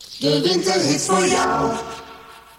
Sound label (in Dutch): Jingle winterhits; Seizoenen